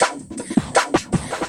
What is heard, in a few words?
LOOP, 160 BPM, Drum & Bass